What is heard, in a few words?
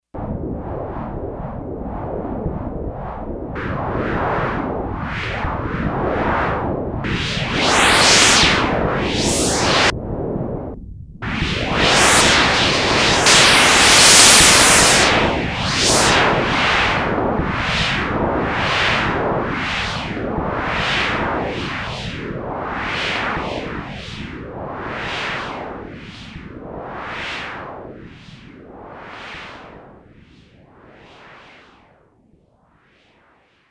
Noise Sweep